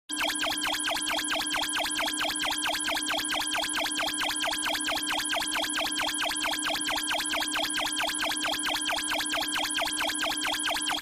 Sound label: Computer Read Out Alarm